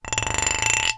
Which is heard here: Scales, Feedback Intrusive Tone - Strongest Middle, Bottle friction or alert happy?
Bottle friction